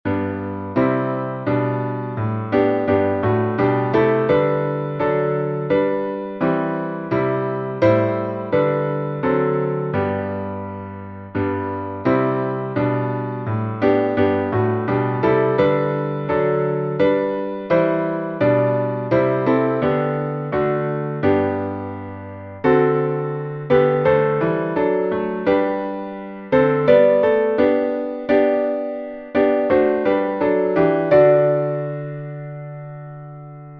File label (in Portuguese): salmo instrumental